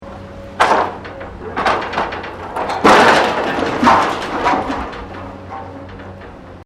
demolition factory wrecking, Japanese building demolition site; Construction